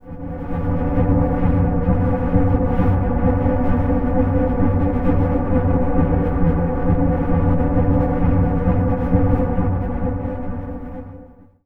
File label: pillar drone B, ancient drones; Zero-G Seismic Frequencies; 90 s Sample CDs